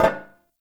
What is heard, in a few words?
Stone Cafe Table Impact